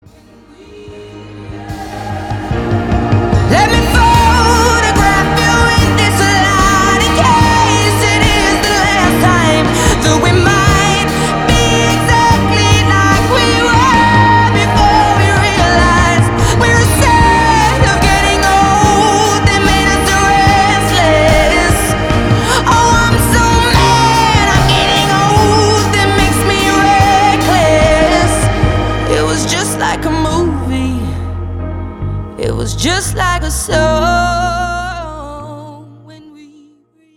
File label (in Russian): Вокальный крик